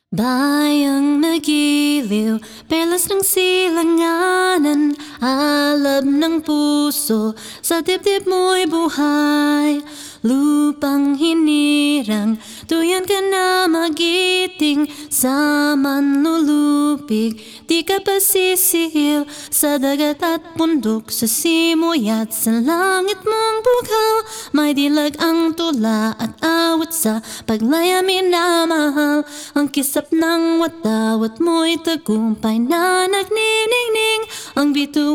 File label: Acapella